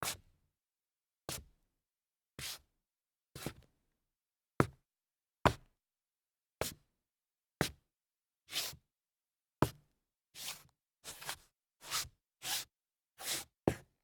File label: shoe scuffing on wood